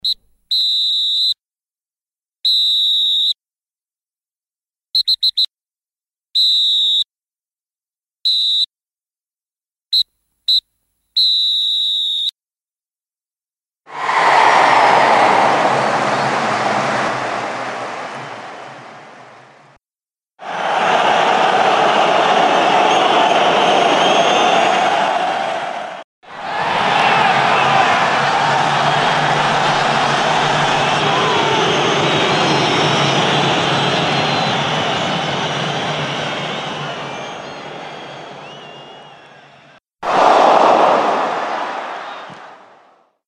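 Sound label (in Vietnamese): Tiếng còi trọng tài đá bóng và tiếng khán giả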